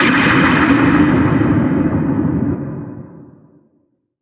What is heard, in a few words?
NOISE, Production Effects